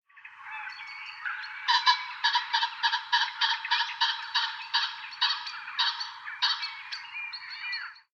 bazant, fazant roep; peter boesman

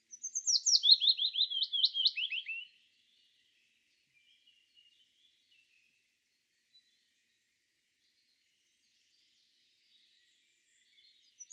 piecuszek, fitis zang; peter boesman

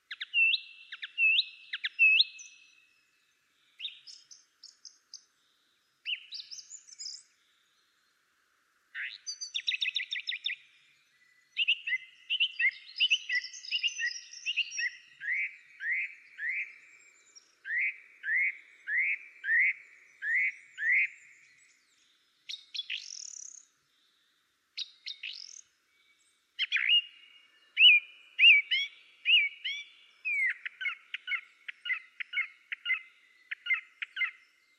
drozd spiewak zanglijster zang; peter boesman

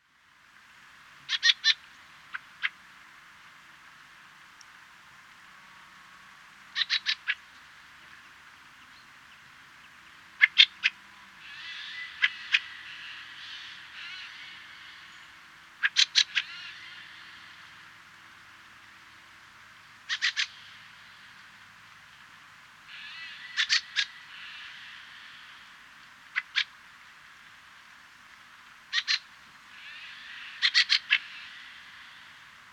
kwiczoł kramsvogel roep; peter boesman

